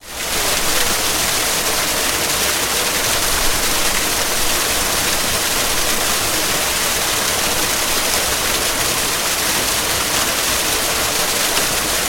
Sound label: Waterfall Fast Splattery Long